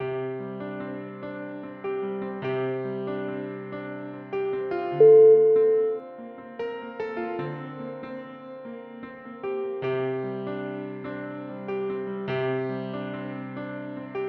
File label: flying piano